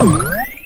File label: power up; subway surfers